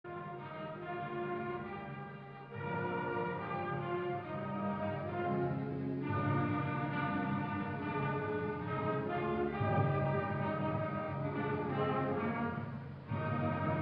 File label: Ochestra, College Song